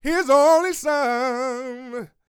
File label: Vocal Gospel; Vocal Planet Vol1 Gospel